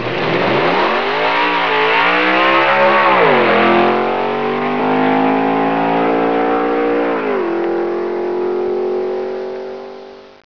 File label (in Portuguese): CARRO, EFEITOS